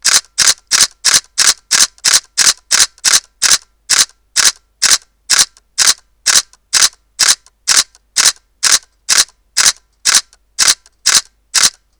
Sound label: Alpha DSLR-A500 continuous lo JPEG; Sony